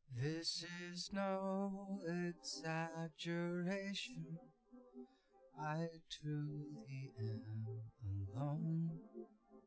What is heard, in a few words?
wdrums inst sim